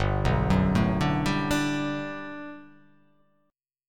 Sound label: G Major 9th, G, down